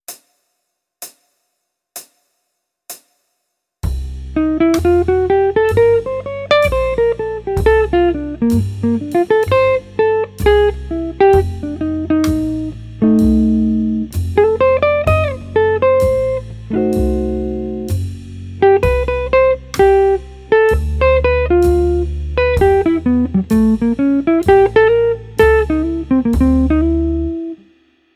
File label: Jazz, Guitar; Guitar Techniques 2018 Issue 289 December